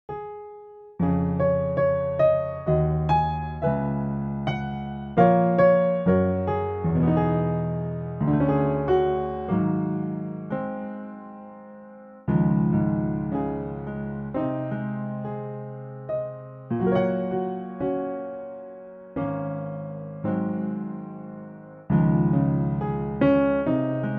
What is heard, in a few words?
piano; Pianotrak; key - Db - vocal range - Ab to Eb